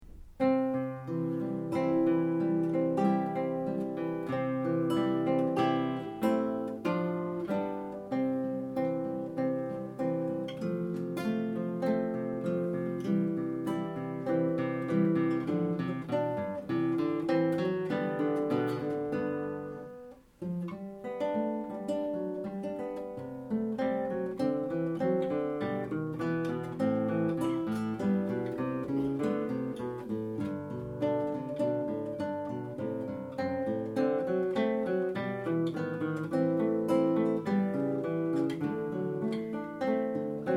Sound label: duet, classical guitar